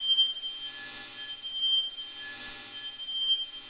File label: Laser Mining High Pi; Laser, Mining, High Pitch Sustain